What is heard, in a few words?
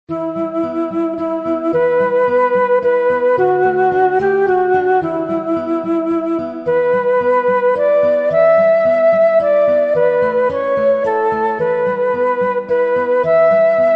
peaceful lovely flute music tone ringtone